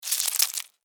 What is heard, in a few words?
Cockroach Run, Cockroach, Run, Scurry, Movement, Dirt, Insect, Bug; Blastwave; Animals:Insects